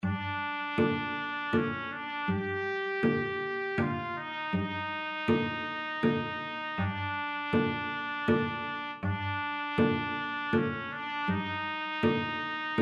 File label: sicilian TPT VLC, trumpet-cello duet; sicilian don